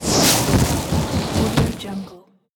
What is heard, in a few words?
body falls and rolls on snow